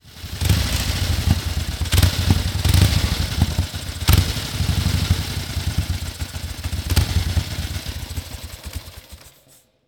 AJS R2

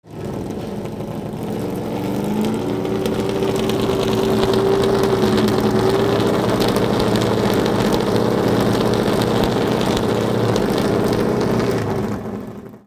nascar pontiac rev

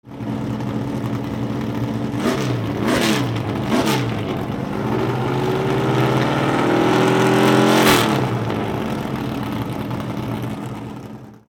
nascar ford

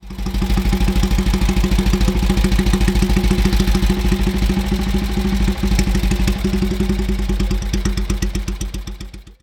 Ariel Sloper

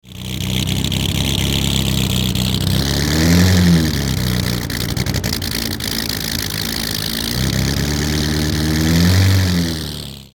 Bugatti T35C

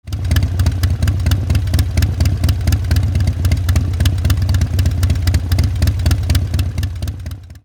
Harley idling

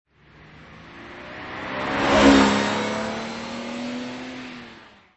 Ferrari 512M drive by

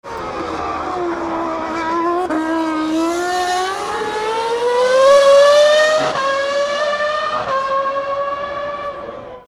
F1

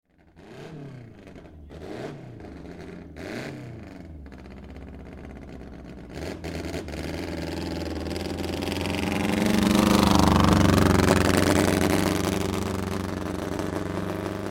Lancia Pagrada Formula Junior

